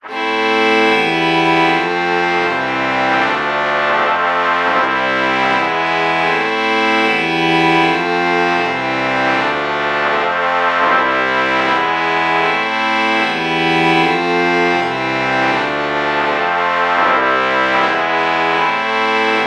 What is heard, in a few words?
trumpet violin chord morph